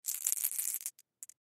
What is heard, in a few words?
Ball Bearing Multiple Hand Roll; Rolling; Foley; SoundEffects-Blastwave